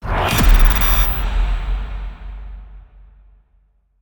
Exit Sting; Void Bastards

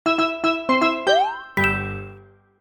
Menu Jingle

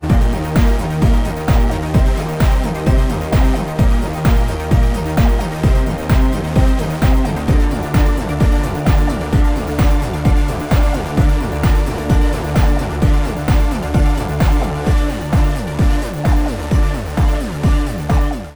Protorave Short; Disco Elysium

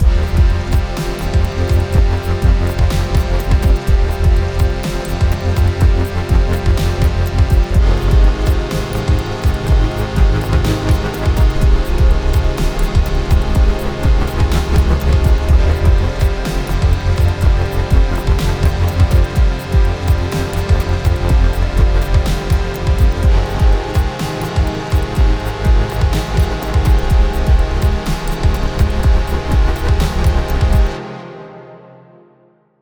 Dissolve Intro; Mixolumia; Dissolve Partc

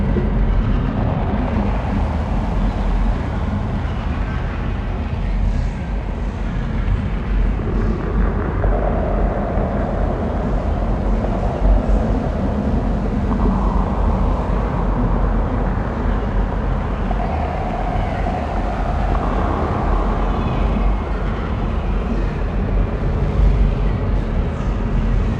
Amb Atomic Bar

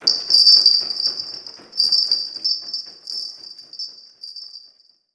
SE - Bell Sounds; fatal frame 20th anniversary celebration soundtrack

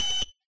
Alarms Beeps Siren alarm beep clock timer; SFX Environment ProSoundCollection v1 3; MONO; Poppy Playtime

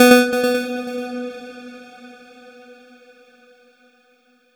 Overlay Tone

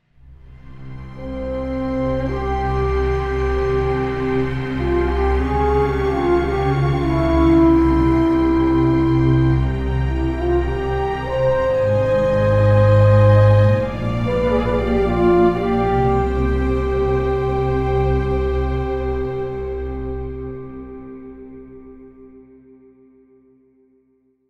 Jingle Arab; rise of venice windows gamerip 2013

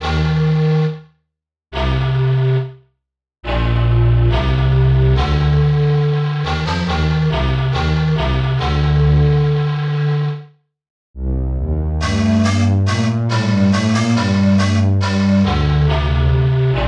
Death, to a deegree; 2DDoors

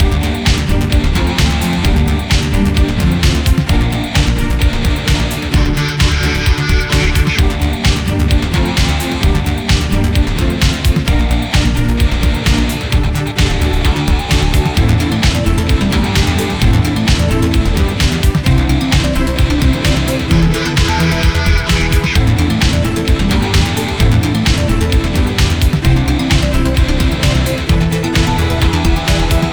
Drone Loop; Go All Out

